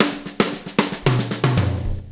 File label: Acoustic Drumloop